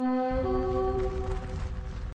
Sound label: Stampede